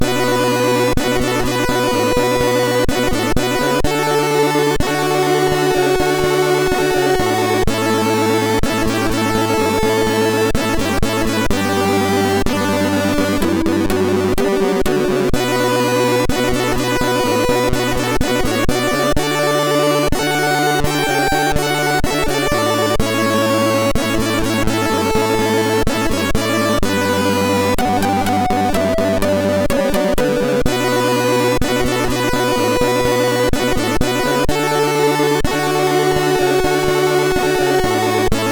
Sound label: Verde note, MOS6581R2